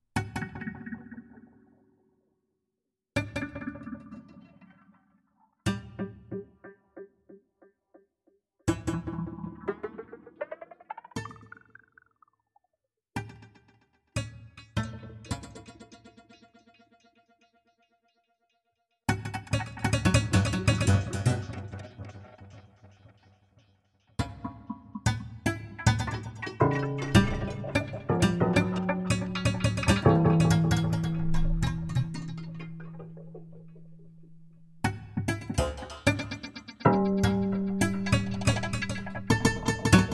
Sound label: Abstract Piano Music Rain Drop